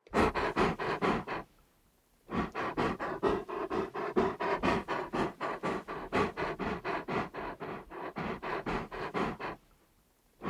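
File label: dog sound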